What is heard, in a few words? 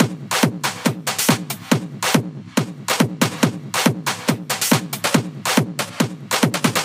Loop 140 BPM; vengeance essential club sounds-3